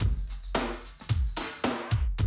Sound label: drum loop, 100 BPM